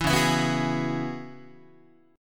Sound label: C sharp Minor 11th, Guitar; C#m11; strummed; JGuitar